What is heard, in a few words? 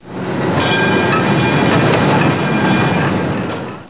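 STREETCA streetcar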